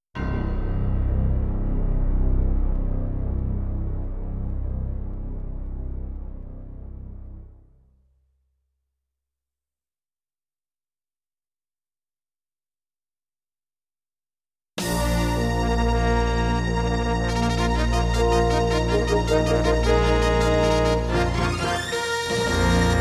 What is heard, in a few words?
Intro, Kawai GMega; Dark Forces